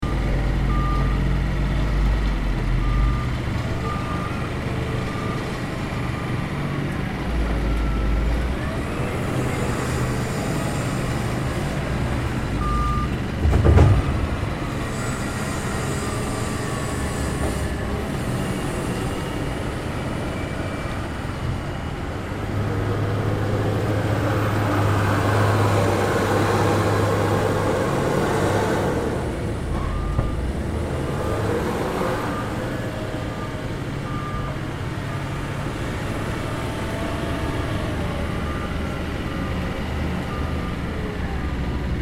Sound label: Construction site heavy machinery ambience